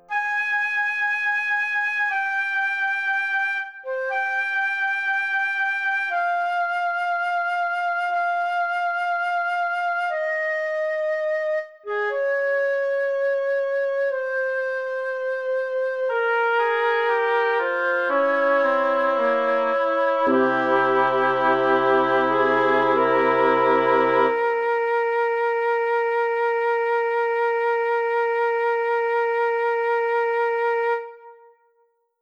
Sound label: flute